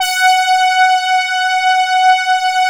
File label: BRASS, PADS; Masterbits; Vintage Voltage